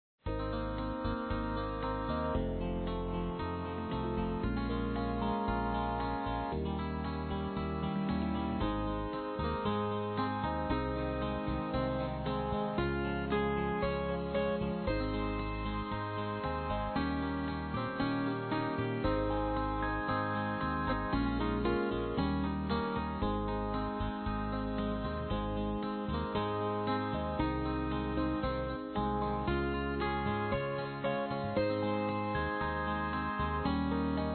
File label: Guitar